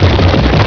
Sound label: rolling stone